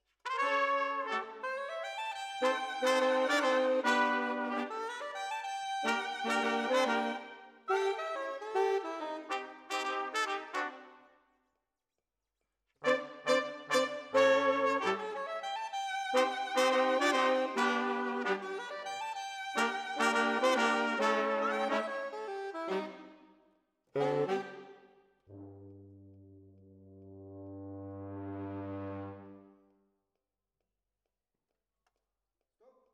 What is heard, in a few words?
Sax